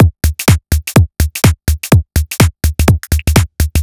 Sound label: 125bpm, House Drumloops